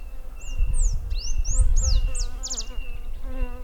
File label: Poospiza pectoralis; medanos